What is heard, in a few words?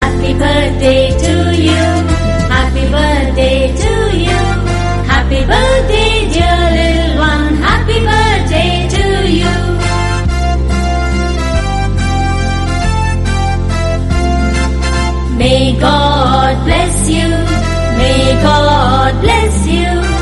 Kids Birthday Happy Birthday English Song; Song Duration:-26 Sec